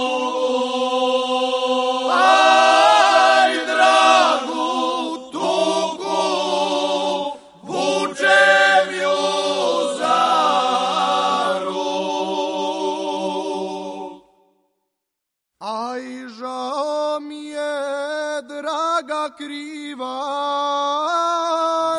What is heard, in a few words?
ACAPELLA